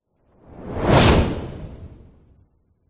sound design whoosh transition swoosh med powerful